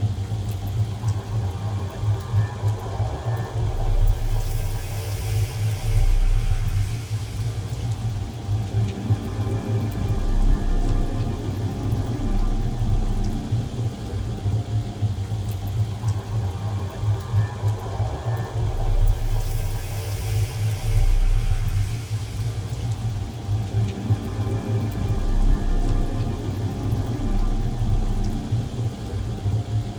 ship corvette idle; Stellaris; machineage

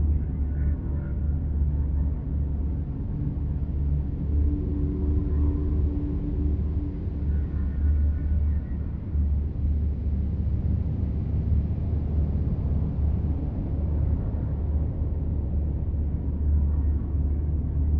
ship military defense station idle; Stellaris; aquatics; ships

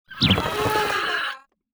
greetings avian; Stellaris

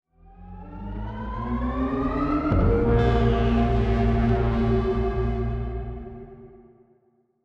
ship construction ship move; Stellaris; shroud; ships

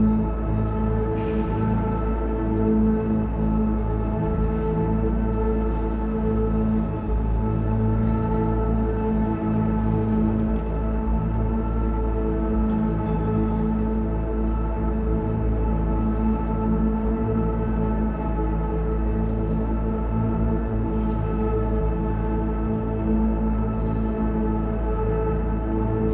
ship colony ship idle; Stellaris; shroud; ships

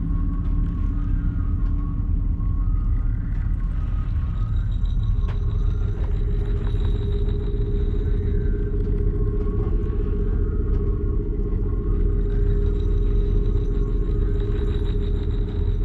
ship construction ship idle; Stellaris; shroud; ships

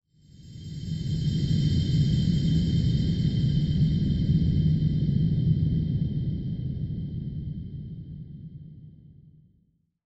cosmic calm; Stellaris; astral planes

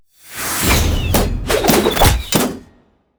archeology event trap arrows; Stellaris; ancient relics

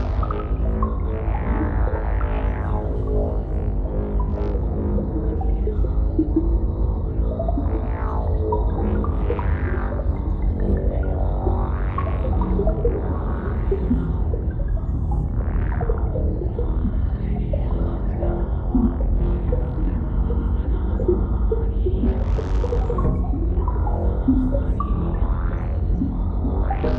ship science ship idle; Stellaris; shroud

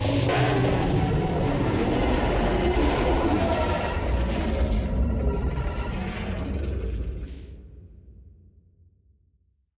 mutated void worms; Stellaris; grand archive

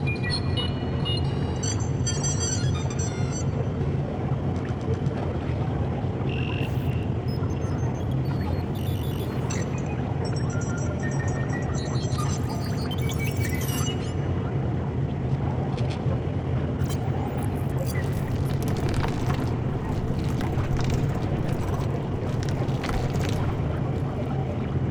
ambient ai civ warship; Stellaris

